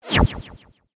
staff fire; smite; ahpuch skin10

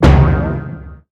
Roll; Smite; god Kumbhakarna

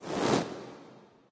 armor; smite; poseidon

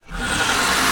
buildup; smite; medusa

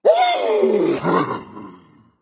yay berserker; cuchulainn

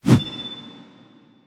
small fire; smite; guanyu

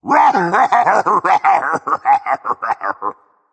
laugh berserk; cuchulainn

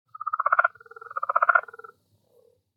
ambience insect; smite

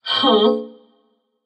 confused defeat; babayaga; smite

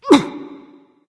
grunt hit; smite; kali

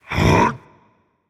grunt lift rage; chaac

